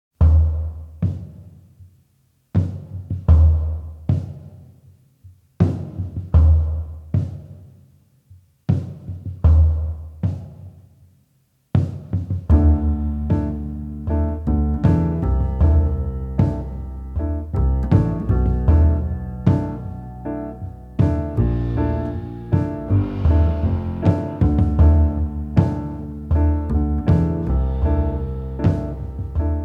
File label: quintet